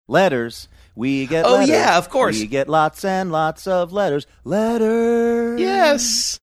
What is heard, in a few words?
singing letters we get letters